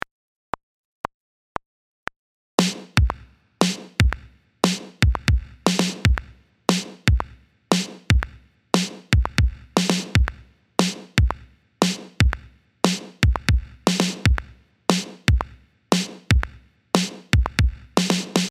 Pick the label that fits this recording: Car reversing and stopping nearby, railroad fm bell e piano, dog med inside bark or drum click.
drum click